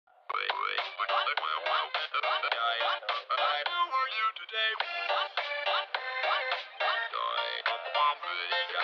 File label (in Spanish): musica de tutoriales